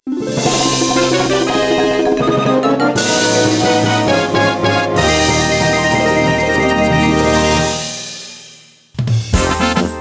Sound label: level clear; Donkey Kong Country Returns HD